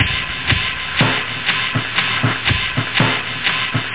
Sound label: breakbeat